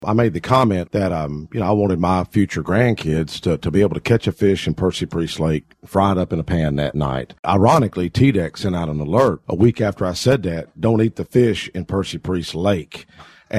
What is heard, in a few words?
sparks on bass